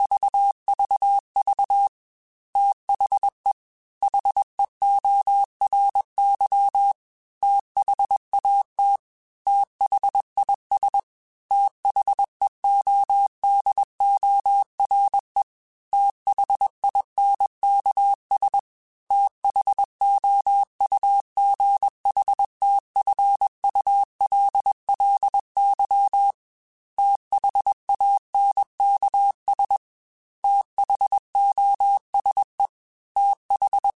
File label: TH Runs, Morse Code; HamRadio; Prefixes